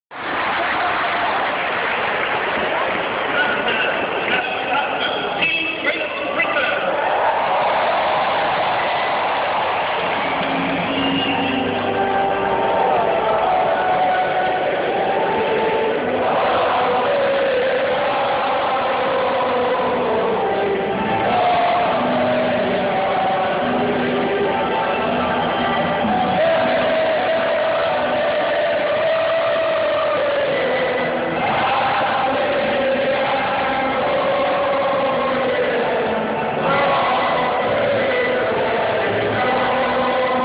british national anthem in hyde park

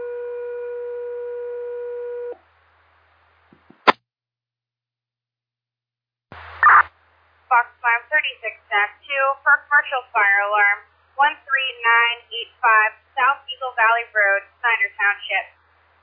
page

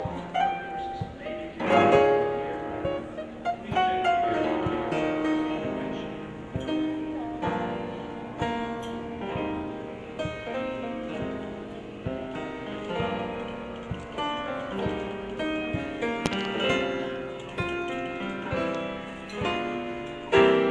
piano at airport; atlanta airport

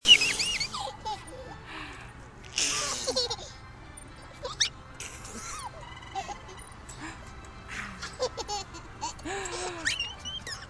giggly on swing

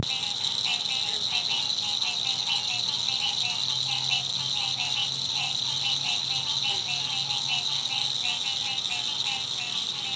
night croaks

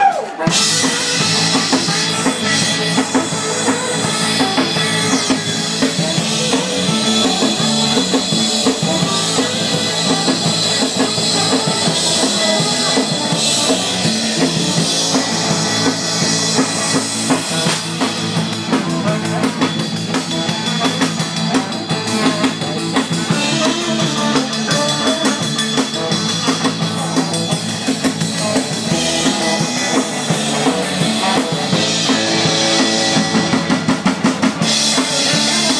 live music

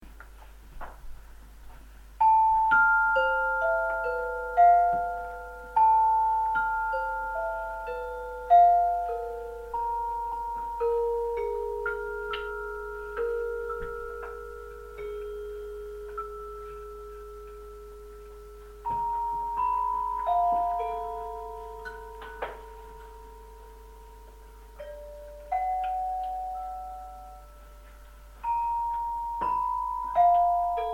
chime bar bong

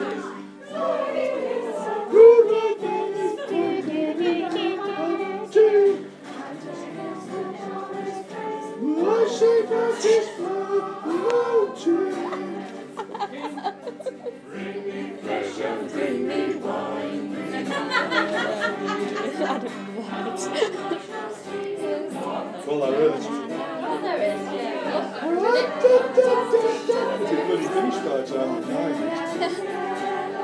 carol singing with the awesomest old people ever

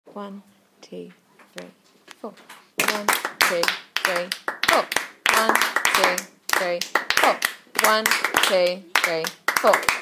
rhythm polished

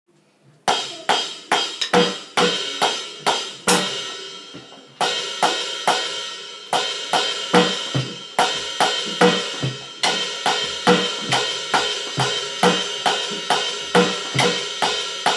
drumming

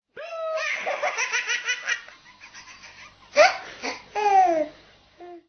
music

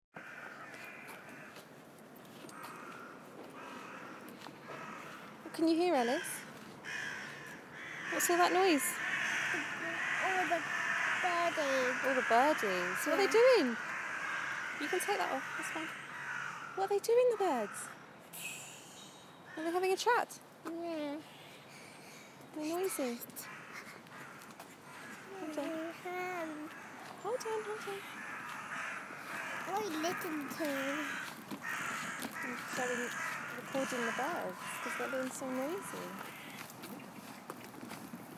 flock of crows

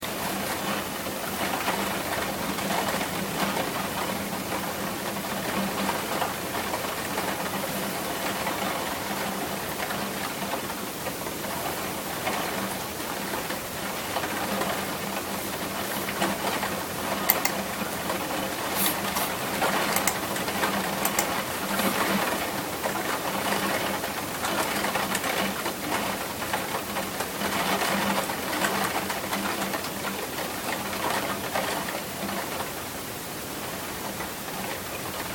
rain on my office window; uksm

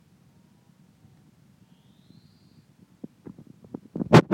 bird

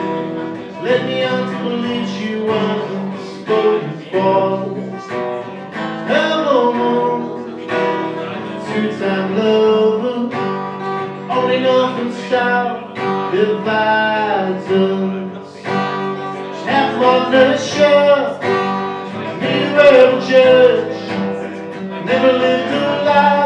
acoustic

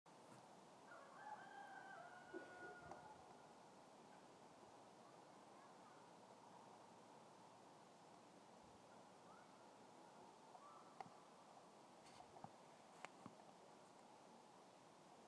kukeleegu sounds of an estonian rooster

